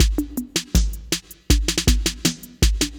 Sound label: Synth Loop, BPM 80